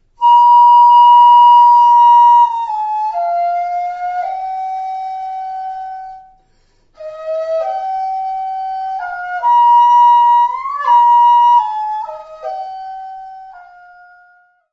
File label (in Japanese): 正倉院尺八